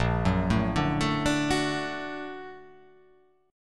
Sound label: A sharp Augmented Major 7th A sharp, guitar